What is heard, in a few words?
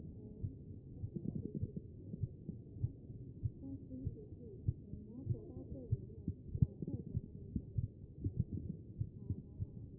CHEST; B MODE